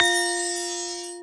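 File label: spin click; Paint 'n Play Pony; Crayola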